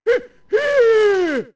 Scared

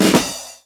Totem Drums Lifted; Totem drums: Lifted / caught; Totem drums: Lifted / caught by another Link

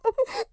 Sob; Beth

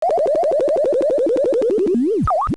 Die, dying; LOZFDS; Link dying

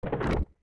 Clock Tick; Zelda; MM

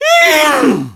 Whine; Yuga whining (challenging Link, also heard mid-battle)

